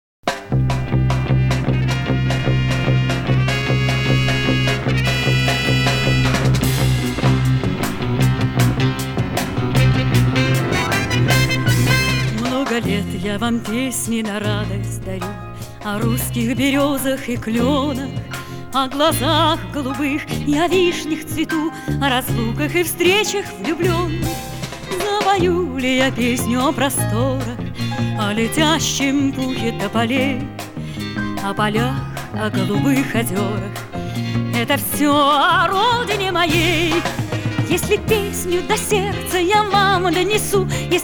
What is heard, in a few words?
pop